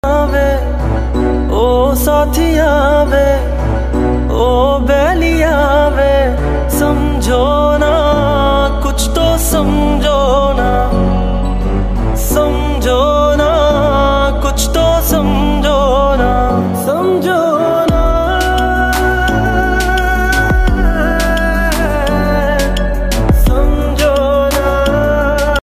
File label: unplugged